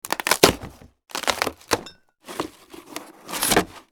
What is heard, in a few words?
Cardboard Box